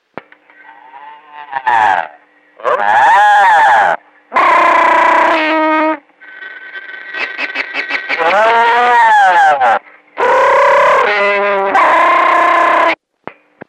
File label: Mooo